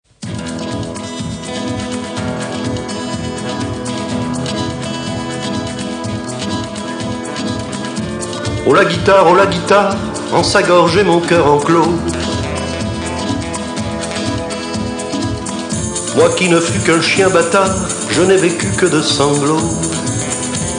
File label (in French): la guitare